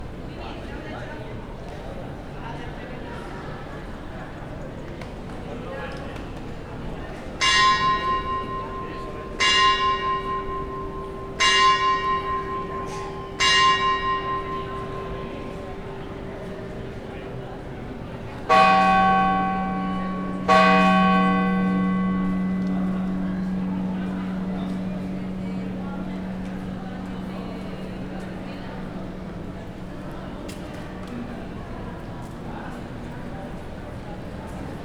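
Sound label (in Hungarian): tizennégy órai harangozása mecset; meszkita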